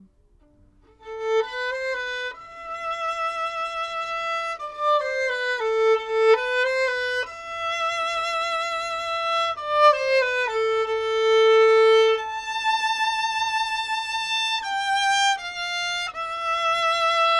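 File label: domra, Neumann TLM170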